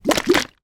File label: food drink lemon juice shake short; zapsplat